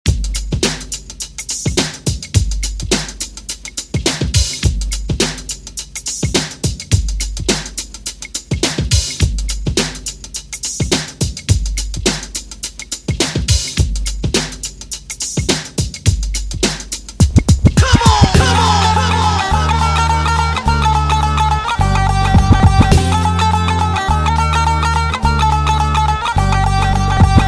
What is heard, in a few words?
Extended Redrum 105 Bpm